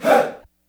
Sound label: "HEY", Heart of Africa, shout